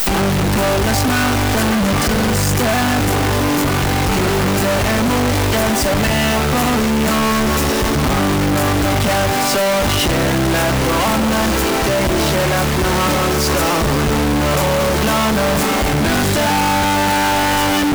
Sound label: 1 Bit